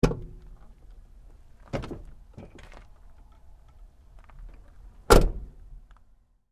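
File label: Truck GMC Savana Door Open Close Light; Vehicles; Trucks; Big Room Sound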